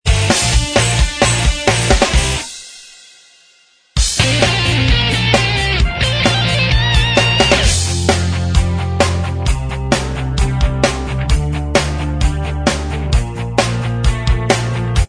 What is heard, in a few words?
Inst Track